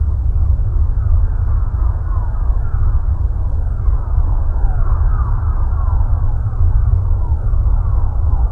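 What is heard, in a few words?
alien ambience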